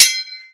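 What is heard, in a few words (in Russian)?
меч звонкий в пространстве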